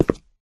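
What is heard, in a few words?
Grunt Movement Footstep Walk Concrete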